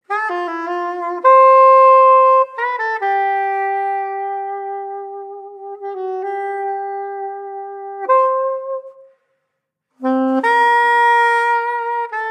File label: sepia soprano